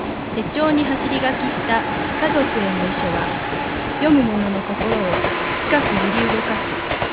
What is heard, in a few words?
Train in